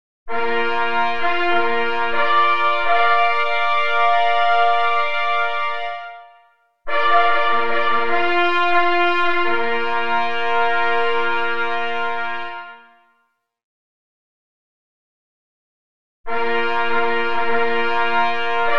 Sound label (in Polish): Sygnaly okolicznosciowe na 3 plesy; Tusz nr 1; Tusz nr 2; na 3 rogi mysliwskie; E. Kruszelnicki; Eugeniusz Kruszelnicki (1933-1996)